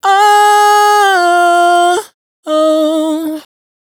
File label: riff Medium Oh 97 Ab, Raw Vocals, Dry Vocals; Slate Digital Omnivox Vocal Sample Pack